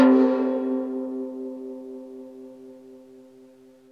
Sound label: TIMPANI C4